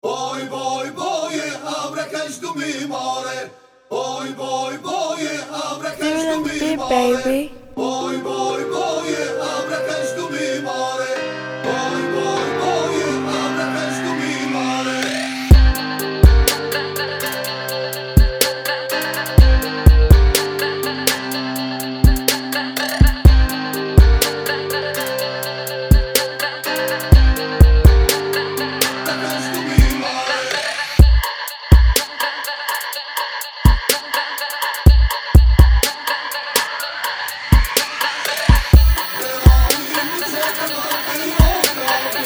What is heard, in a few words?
HipHop Freebeat With Hook Freestyle; Prod By Dwill Dff